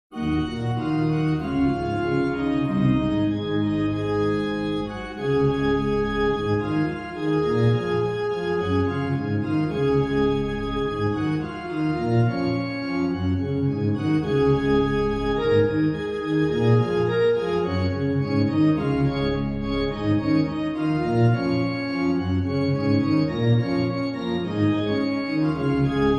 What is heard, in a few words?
Organ Cover